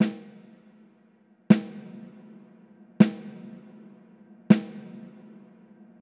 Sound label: Straight Snare